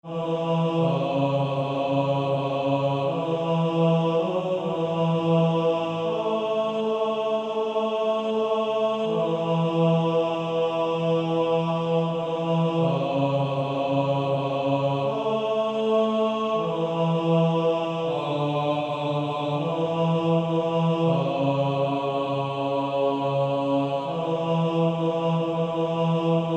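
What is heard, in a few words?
Male Choir, bass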